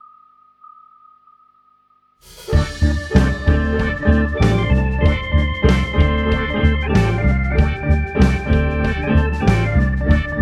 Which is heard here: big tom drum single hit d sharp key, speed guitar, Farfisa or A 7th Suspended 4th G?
Farfisa